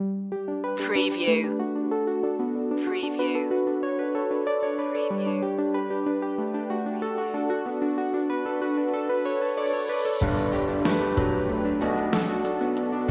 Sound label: think space no guitars